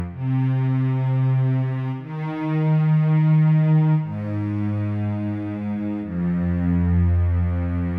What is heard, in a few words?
Cello